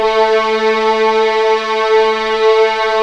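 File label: A3 legato forte, violins; East West; The Ultimate String Collection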